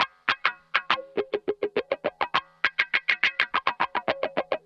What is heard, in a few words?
103 FUNK GTR WAH; 103 BPM FUNK B; Drumdrops; Compact Disco